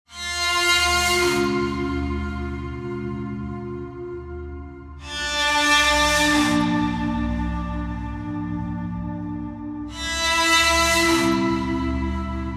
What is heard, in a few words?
Stings Elements Dumpster Bow; Natural Forces